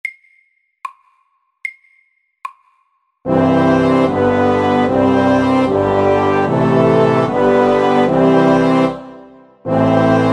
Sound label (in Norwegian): Kromatisk koral FORT